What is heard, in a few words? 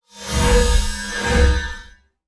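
Summon Pendulum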